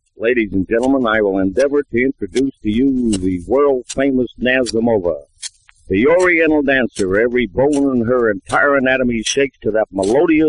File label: Singing